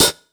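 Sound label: 127 drum 2 R, Fresh Disco House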